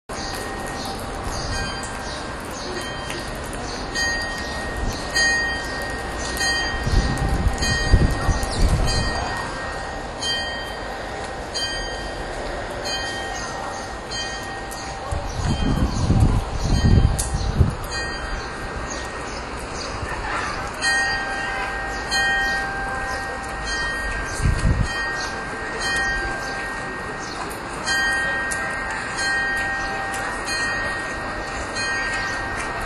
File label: bell; karion